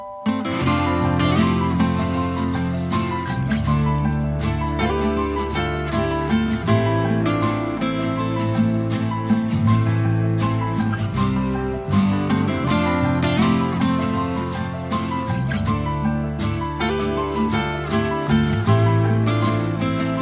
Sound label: Calming